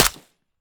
mech, m16 weapons; Urban-Coalition; Decay; arccw ud

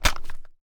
grab, weapons; Urban-Coalition; Decay; arccw ud

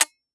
dryfire; weapons; m1014; arccw ud

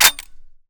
dry fire, weapons m249; Urban-Coalition; Anarchy; arccw ue

